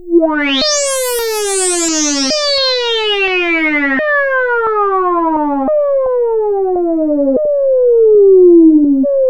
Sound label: HI FARTY, HORRID; Zero-G Ambient 1; HORRID+FARTY